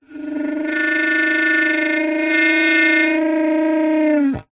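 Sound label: foley blowing air into pipe with squeaky mouth piece weird sound design element; zapsplat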